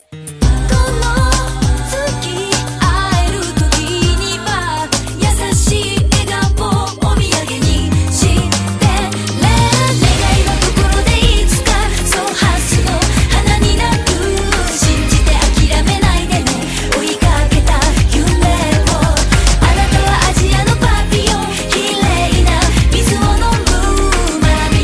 japanese remix

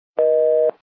line busy

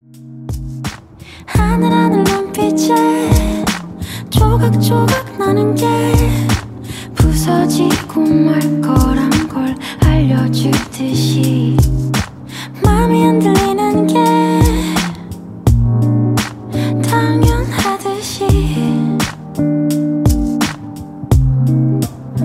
Cello; Fall

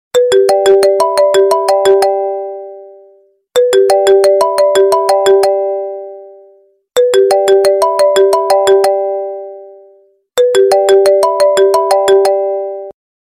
apple i phone

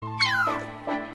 meow; kirara

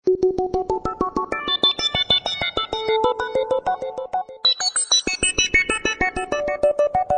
electronic 3d

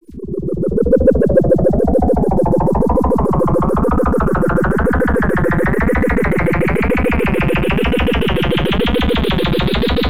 electronic techno

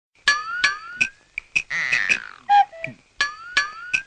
funny alert

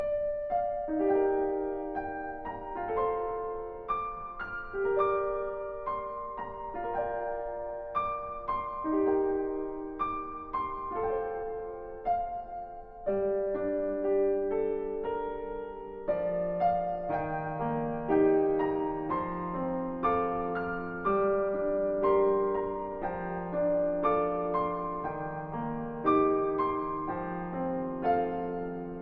piano theme; inuyasha

